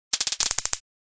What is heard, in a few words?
Taser buzz electrical clicking stun gun